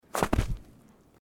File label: footsteps snow